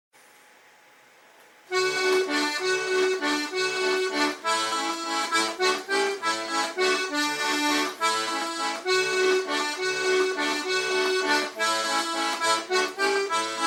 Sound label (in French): accordeon